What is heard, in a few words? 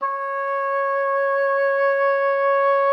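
C#5, oboe d'amore; Xsample VOL-7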